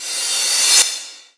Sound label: Cymbals Reverse; vengeance essential club sounds-3